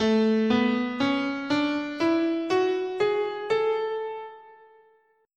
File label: A Ionian, scale